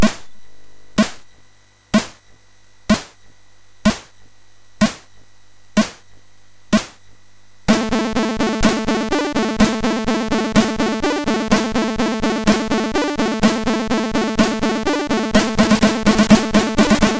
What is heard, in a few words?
Percussion, MOS6581R3